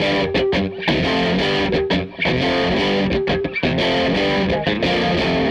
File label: Tele Guitar Loop